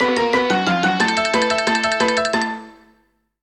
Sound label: alarm